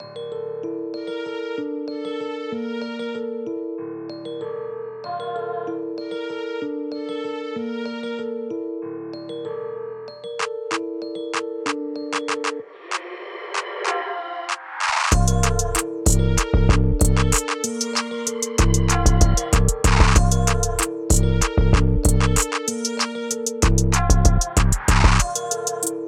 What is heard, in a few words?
magnet hard trap type beat